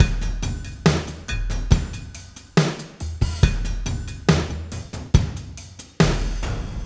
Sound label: SloMo Beats, Loops 140 BPM